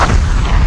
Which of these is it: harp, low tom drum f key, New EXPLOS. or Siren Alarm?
New EXPLOS.